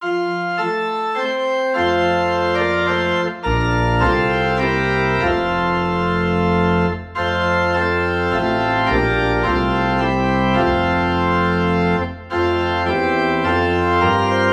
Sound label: Organ in F